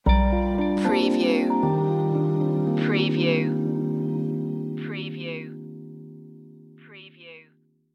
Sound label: distance arriving stinger